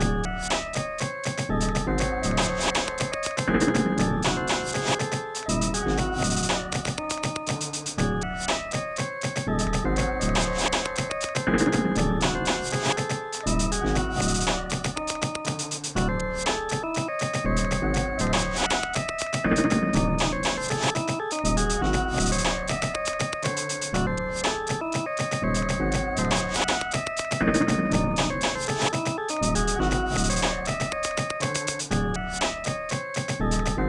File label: chirpy breaks